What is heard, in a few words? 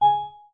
Class Harp, notifications